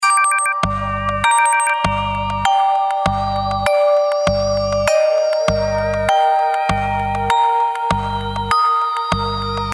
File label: Alarm